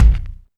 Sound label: Kick, Alesis DM5